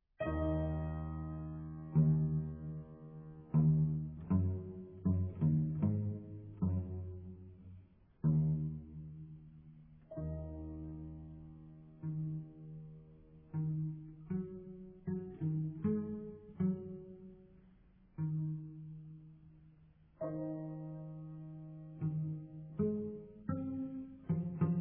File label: cello and piano; BOHUSLAV MARTINU; Sonata No 2